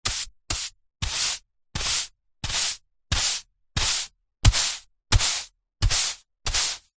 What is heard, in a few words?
household brush broom stiff sweep hard floor